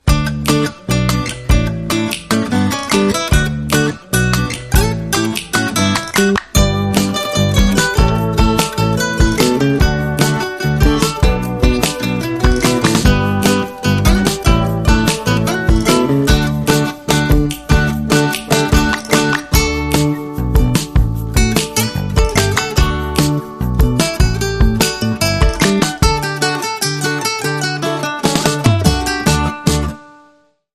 No Cymbals

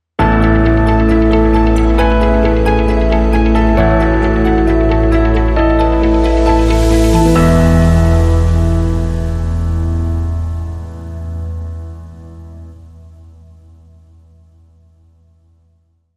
Sting No Perc

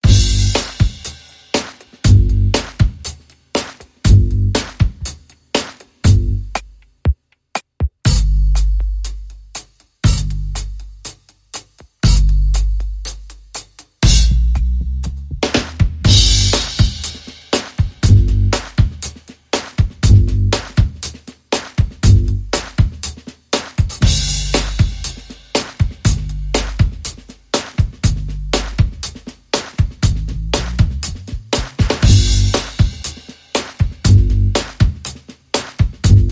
Drum & Bass Only

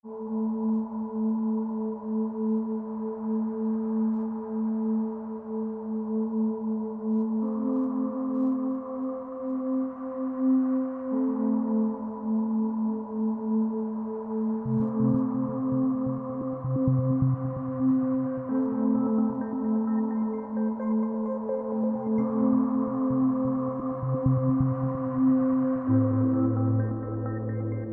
Drone, Light

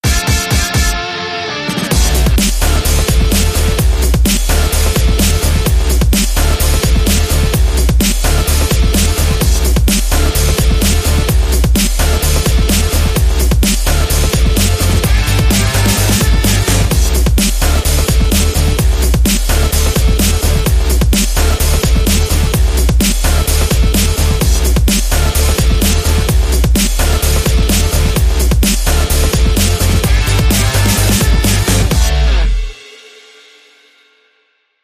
Guitar On Fire Short

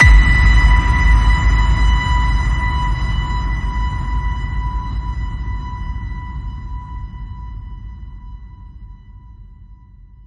Ping Of Doom, B

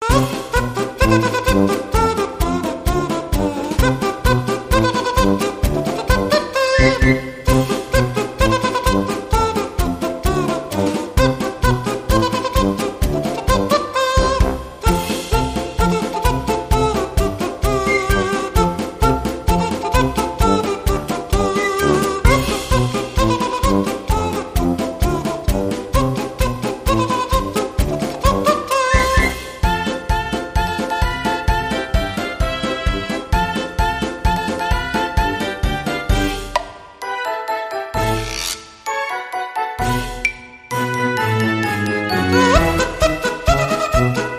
Rubberkazoo Band

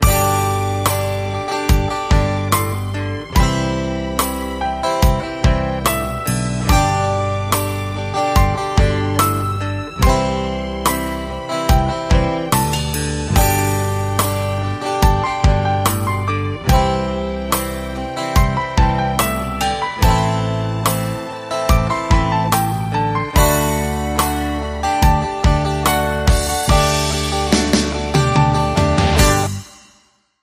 Rock Ballad

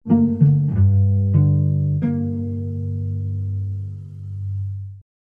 Serene Cello

